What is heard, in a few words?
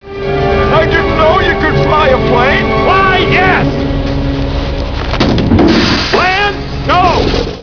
fly yes